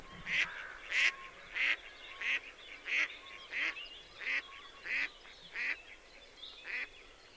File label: Mallard Anas platyrhynchos; waterfowl